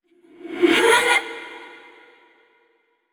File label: laugh short; yamiko